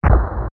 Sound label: Cave Mono; Cave rising from the water